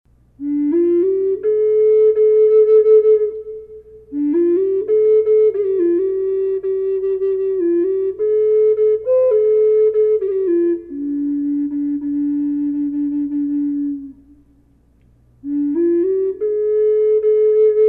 low d sequoia reverb, flute; black bear; collector flutes

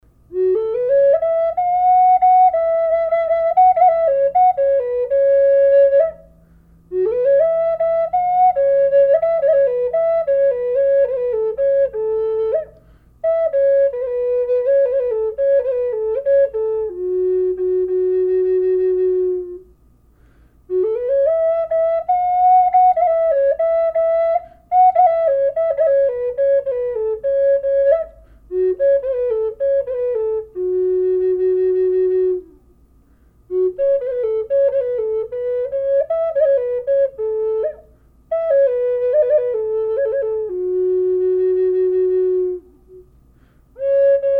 california bear flute; collector flutes

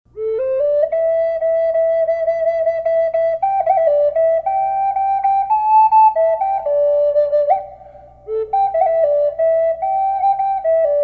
colibri hummingbird reverb a flute; collector flutes

